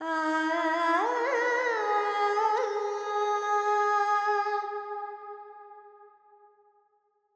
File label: Verb Phrygian, Vocals, Carnatic Female, Alaps